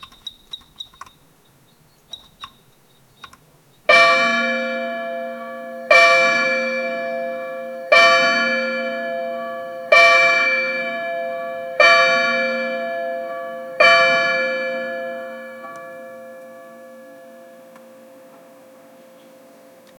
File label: sci-fi cuckoo clock